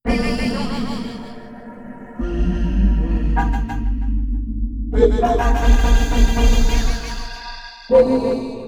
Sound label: voice noise; chromaris